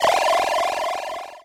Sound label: teleport